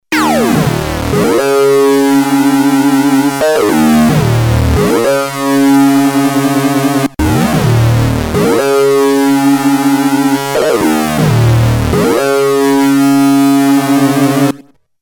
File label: Lead; DaftLead